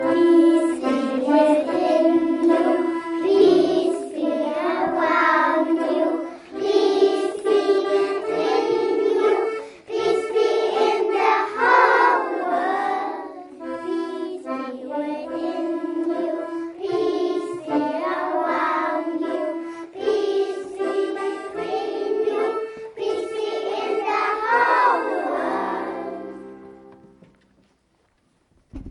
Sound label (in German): Kindergarten Lied Handorgel; Weltfriedenswoche